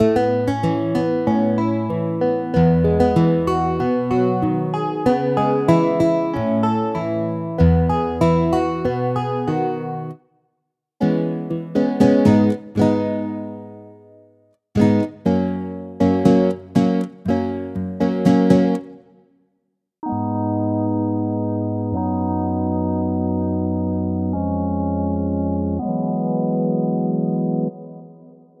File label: Country